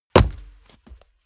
step barefoot wood parquet jump; menschen; schritte

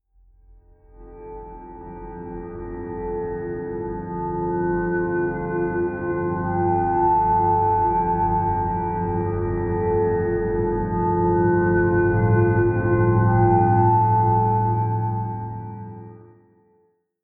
atmospheric peal deep, drones; musikelemente

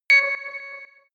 bing delay high; kommunikation; signale

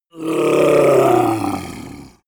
yeti growl angry painful; kreaturen

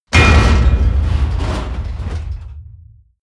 hit metal

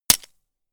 gun shot silencer attached; schusswaffen

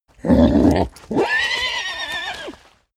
horse neigh loud; natur-tiere; pferde

